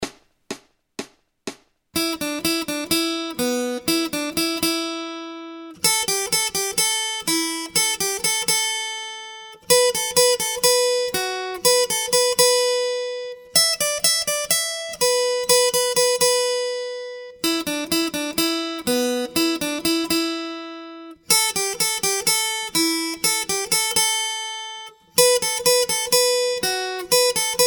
Acoustic Accompaniment, acoustic guitar

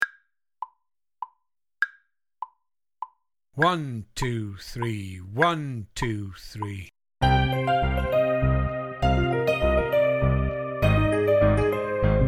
Ripples Backing, drum kit

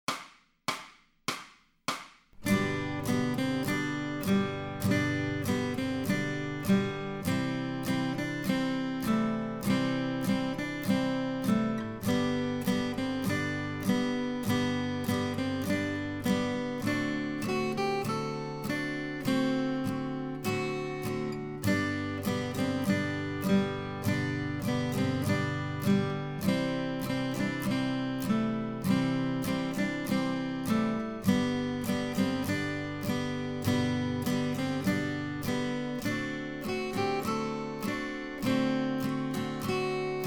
Accompaniment, acoustic guitar; Grade 1

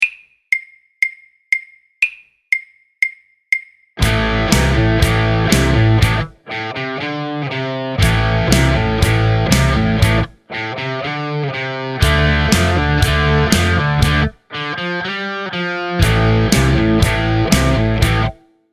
Hard Rock Full Mix; Hard Rock Cafe; Demo Mix

